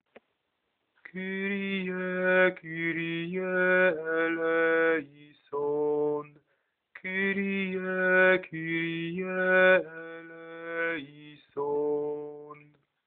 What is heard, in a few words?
Kyrie alt